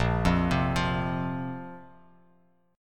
Bb Suspended 2nd Bb down Bass Standard; arpeggiated

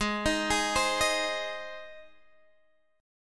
Gsharp Major, Guitar, Standard; arpeggiated; x,11,13,13,13,11; JGuitar

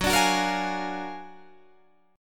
F sharp Augmented Major 9th F sharp Guitar Open D

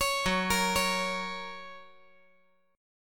Gb Major

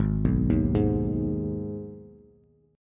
G sharp Minor G sharp down Bass, chord; G#m arpeggiated; JGuitar

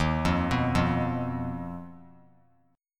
Eb Suspended 2nd Sharp 5th; Ebsus2#5 arpeggiated; JGuitar

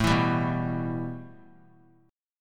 A 7th Suspended 2nd; A7sus2 strummed

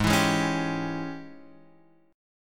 G sharp Major 7th Suspended 4th Sharp 5th, chord

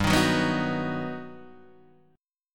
G Augmented Major 7th, Guitar, DADGAD

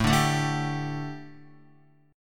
A 7th Suspended 4th Guitar, chord; A7sus4; strummed; JGuitar

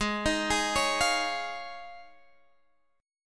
D sharp 7th Suspended 2nd Suspended 4th G sharp

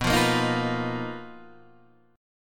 B Major 7th Flat 5th B down Guitar DADGAD

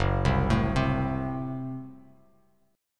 F sharp Diminished Bass Standard; F#dim arpeggiated

